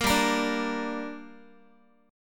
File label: G Minor G down Guitar, chord; strummed; JGuitar